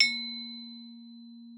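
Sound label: xylophone A#4; Xsample VOL-4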